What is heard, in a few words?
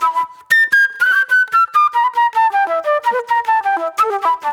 Agro Fusion Flute